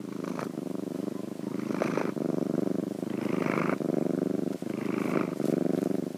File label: Cat Purring; Loops; Texture; Ableton Live 12 Lite; Core Library